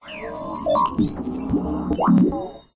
science fiction robot power up slow; zapsplat

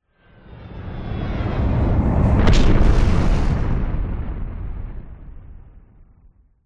airplane jet crash explode designed; vehicles

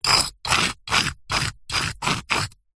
stone small scrapes various on rock or brick; zapsplat; foley

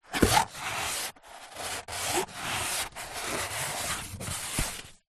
foley cardboard flaps pieces slide together; zapsplat

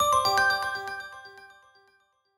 multimedia alert tone piano glock

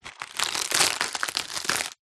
food egg carton box squash eggs crack break; zapsplat

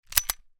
gun 1911; weapon; still north media

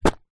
household rubber glove drop on carpet

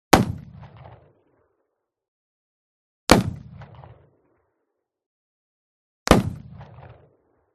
Revolver Shot 44Cal Remington 5m Rear; WEAPONS; smartsound

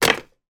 foley battery throw into drawer; zapsplat

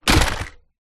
impact plastic object small light

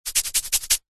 human skin scratch fast; zapsplat

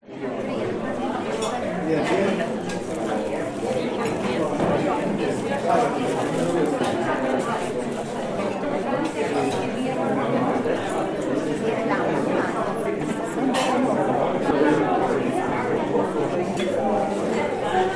Sweden Cafe; audio hero

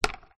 drone dji phantom 3 prop spin push finger; transport

